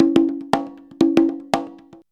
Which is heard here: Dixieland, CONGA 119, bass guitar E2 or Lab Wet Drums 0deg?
CONGA 119